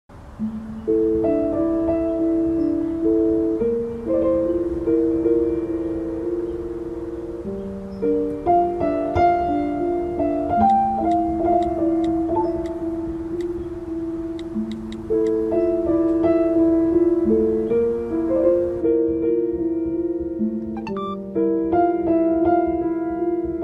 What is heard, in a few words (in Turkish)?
Enstrümantal fon müzik Piano Versiyon; Yasak Elma